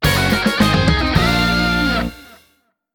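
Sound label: jingle mission success; Tousou Chuu The Great Mission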